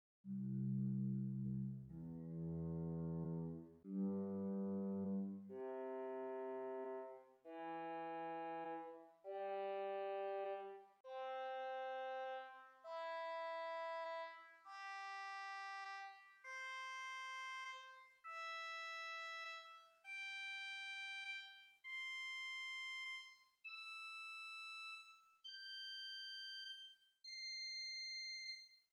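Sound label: Swell Viola 8 arpeggio; Hauptwerk; St Annes Moseley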